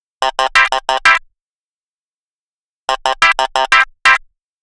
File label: EQ Clav Chord; keys